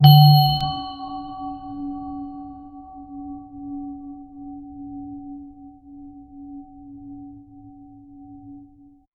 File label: one shot